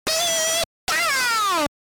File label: Pikachu SFX; RBY